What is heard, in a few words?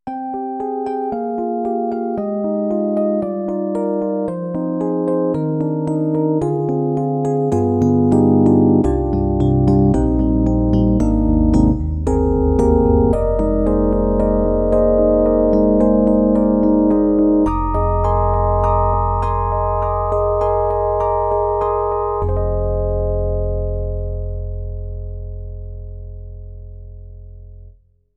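SD-90 Classical Electric Piano; EDIROL; E.Piano 2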